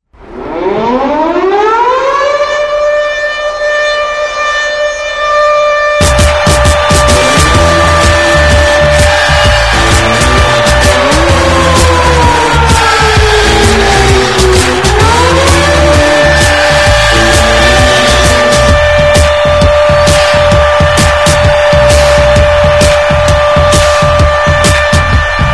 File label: Air Horn Siren